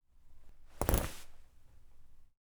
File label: rip open jacket; prosoundfx